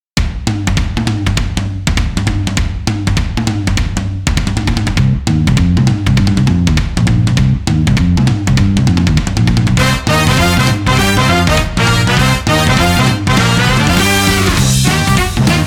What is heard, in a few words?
jazz bgm